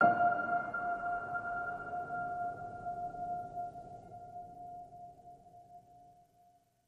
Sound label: Piano Ping F